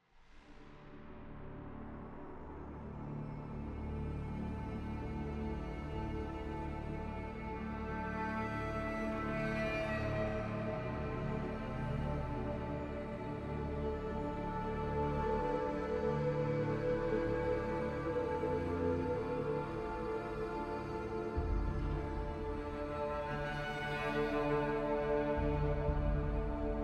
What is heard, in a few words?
music inqueue loop; classic sru; summonersrift